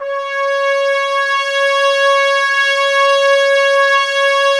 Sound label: Woodwind Brass Ensemble; Miroslav Vitous